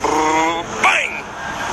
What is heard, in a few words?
Ou Bang